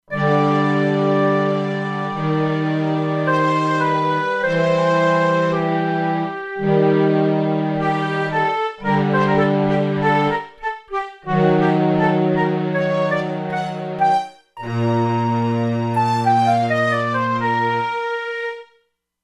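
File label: Strings-Flutes; Factory Presets; E-mu Proteus-2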